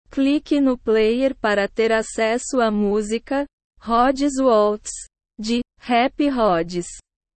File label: waltz